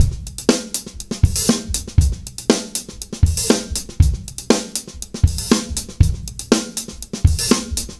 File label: Live Beats, R&B Drums; Sonic Foundry (Sony Creative Software)